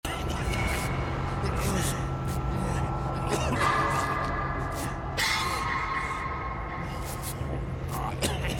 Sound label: healing; Dead by Daylight